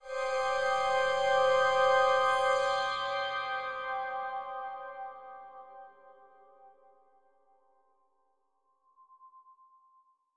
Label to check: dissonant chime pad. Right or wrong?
Right